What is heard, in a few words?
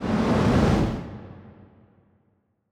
kaiju juvenile tail swish long; Stellaris; biogenesis; ships